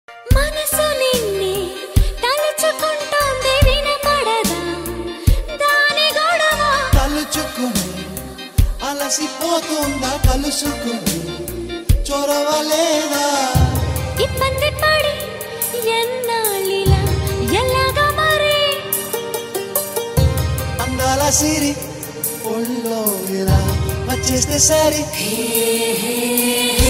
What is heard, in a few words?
telugu love songs; thammudu